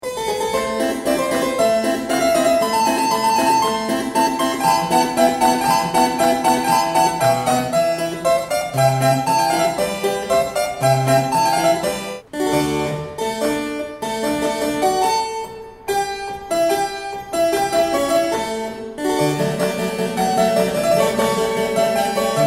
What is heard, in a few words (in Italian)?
CLAVICEMBALO